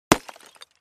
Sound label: Clay Chimney Vent Hit, concrete & brick